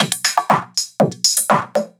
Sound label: Filtered Beat, Trance; Sonic Foundry (Sony Creative Software); Trance NRG VOL-2; Drums